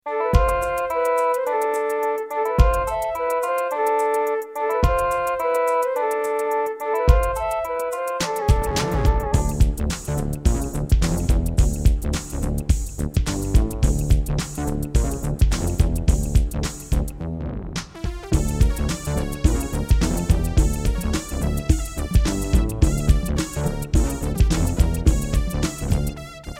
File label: Akai SG01k; Lost Vikings